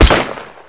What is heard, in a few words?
light rifle shot; WAR